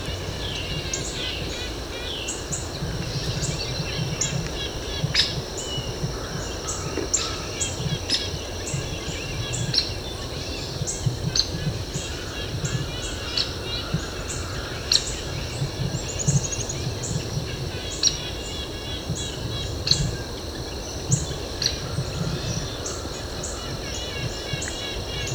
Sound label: Hairy Woodpecker